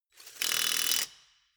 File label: impact wrench screw fast single